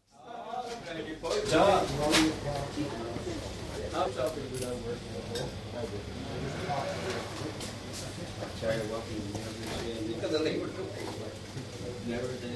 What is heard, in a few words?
Public House at lunch time, quite subdued atmosphere, with cooler faintly in background; Crowd Sound Effects; BBC